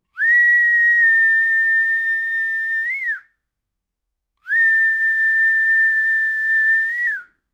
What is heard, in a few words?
vocal